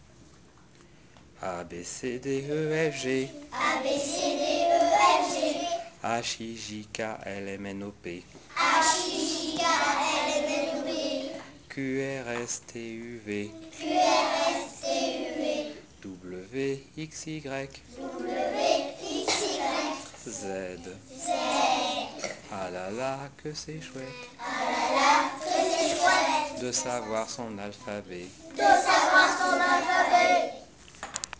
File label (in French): Chanson de l alphabet; classe de GS